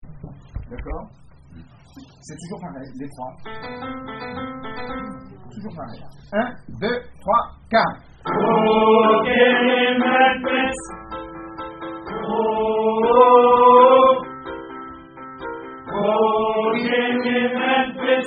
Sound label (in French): Hommes